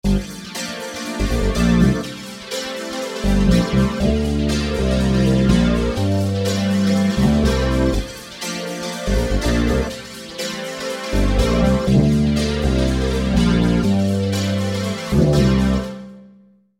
Bass And Cutting, V-Synth

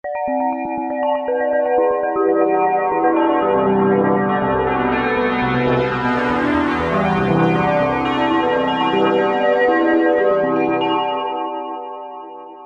Ring Hollow, V-Synth

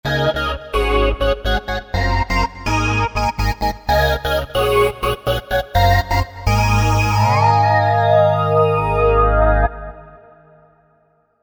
Phased Sine, v-synth

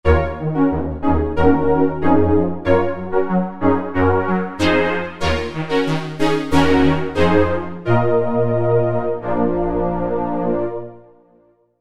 Marcato, V-Synth

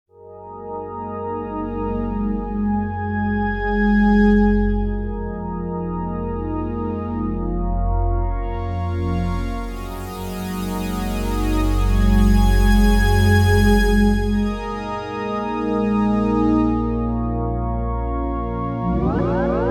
Glass Saw, v-synth

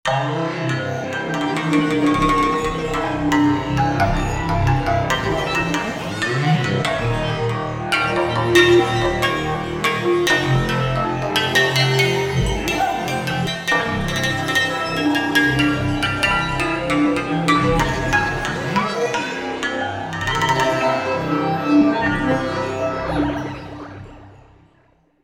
Sitarbla; V-Synth

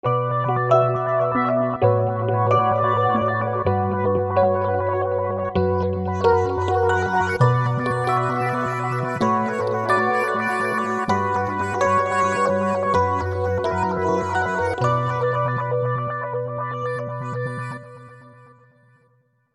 Coltish EP; V-Synth

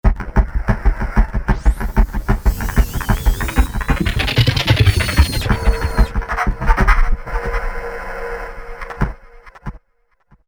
drum noiz; V-Synth

